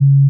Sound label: D flat 3, pure tones